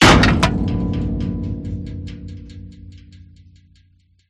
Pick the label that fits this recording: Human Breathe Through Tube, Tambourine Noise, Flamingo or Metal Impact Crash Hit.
Metal Impact Crash Hit